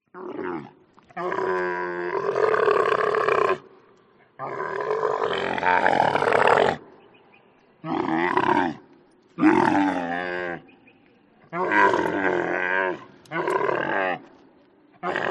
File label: camel